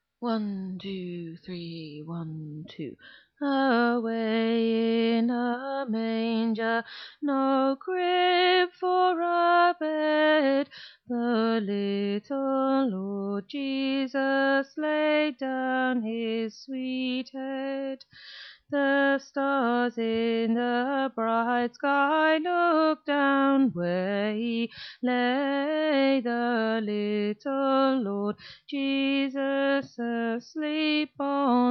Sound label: Tenor